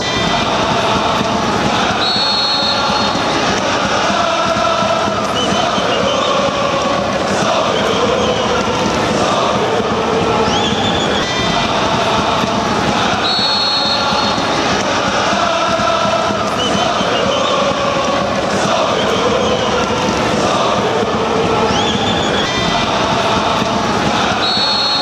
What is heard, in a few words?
la lalala lala blood and gold